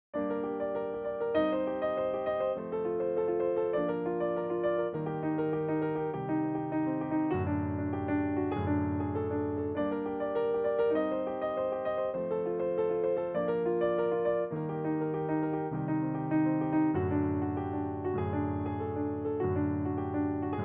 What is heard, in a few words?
guitar solo